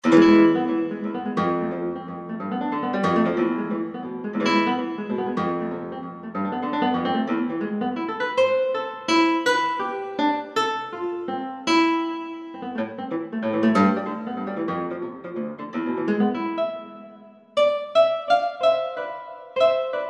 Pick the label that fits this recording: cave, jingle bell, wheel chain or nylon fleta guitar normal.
nylon fleta guitar normal